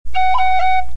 f roll; Pour jouer un roll sur Fa#...; couper avec le doigt de Sol; et taper avec le doigt de E

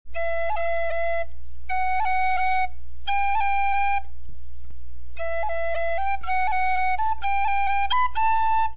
golden stud rolls

